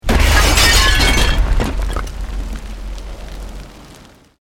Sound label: Explosion Medium Brick Wood Glass